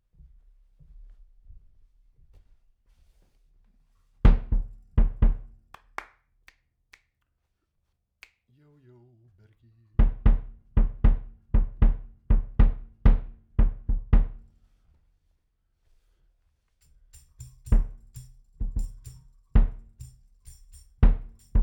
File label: Chordz